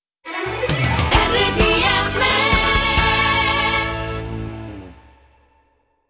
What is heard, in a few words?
JINGLE Radio